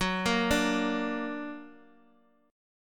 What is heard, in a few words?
F sharp Augmented F sharp Guitar Open D